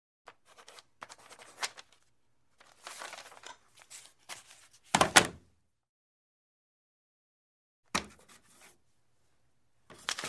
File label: Writing With Pencil; Hollywood Edge Foley Sound Library